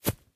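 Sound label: grass, steps; Terrarum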